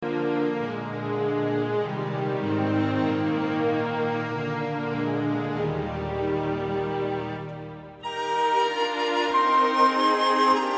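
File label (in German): O du fröhliche Instrumental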